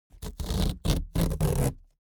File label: object box cardboard big rip open